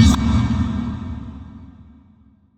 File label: arcane ring; dota